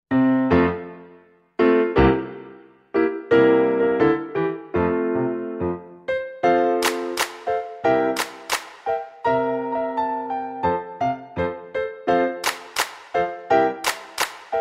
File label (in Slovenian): KLAVIR; ENKRAT JE BIL EN MAJHEN SKRAT